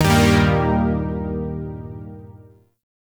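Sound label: Shawzin Minor Chord C; Zariman Shawzin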